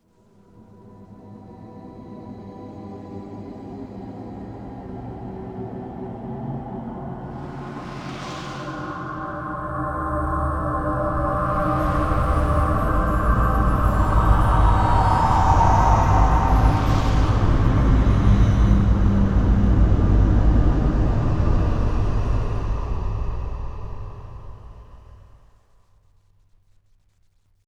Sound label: eff magic